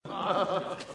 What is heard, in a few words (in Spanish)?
risa bizarra